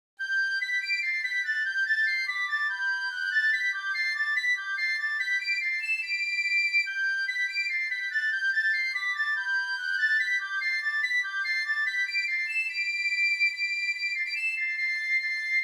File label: quick march